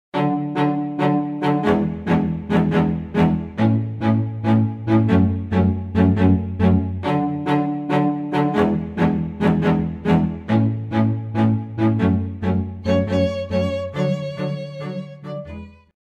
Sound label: String Quartet